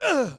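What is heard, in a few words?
Archer Attack Vox; Civ4 warlords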